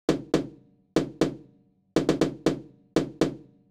High Tom; hydrogen

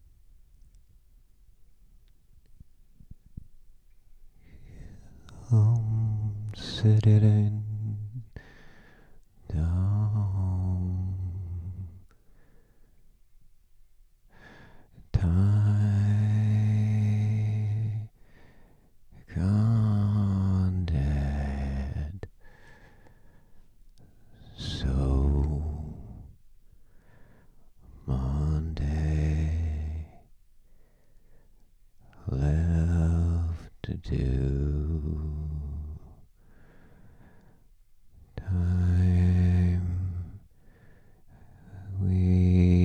vox

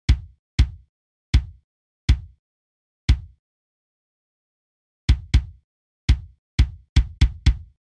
kick dry; hydrogen

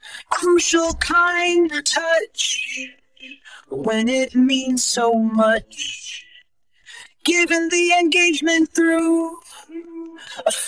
vox; ComfyUI

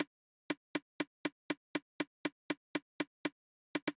Closed HH; hydrogen

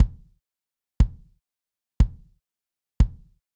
Kick; hydrogen

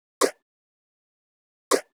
Clap Hard; hydrogen

